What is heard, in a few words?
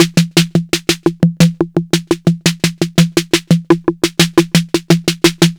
808 tape snare